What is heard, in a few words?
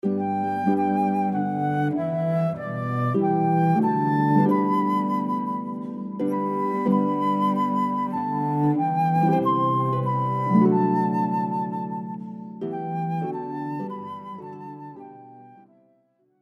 Doxology (Old 100th), harp flute cello